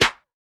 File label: Snares